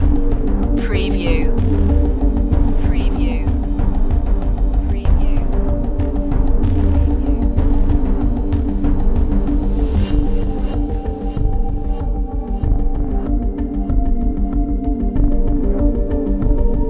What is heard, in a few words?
unknown elements loop